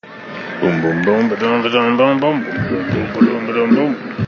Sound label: boom boom boom